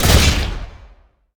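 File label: tekken, impact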